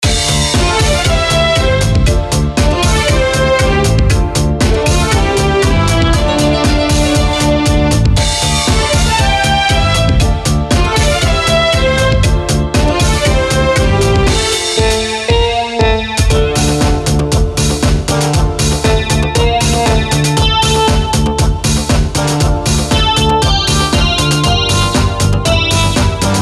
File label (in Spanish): Tango amoroso